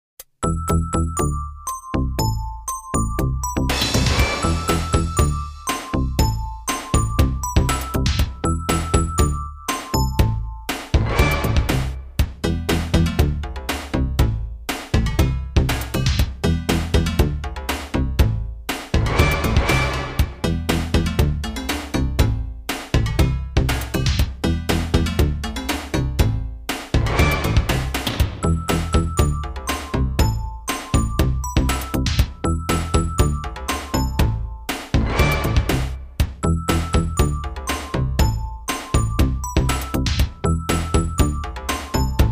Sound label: Jingles; 90 DDD Jingles